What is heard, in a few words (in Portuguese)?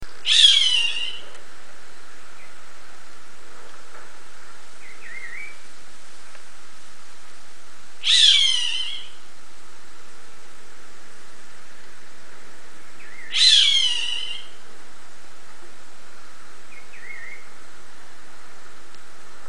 Gavião Carijó